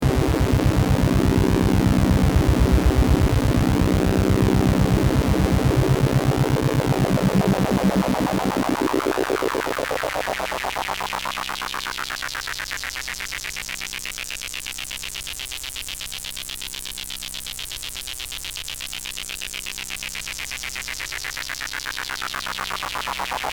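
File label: half res adsr lfo HP, state variable VCF; analog synth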